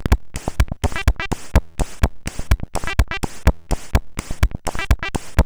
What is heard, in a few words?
Dance, sid CSG8580R5; Odkin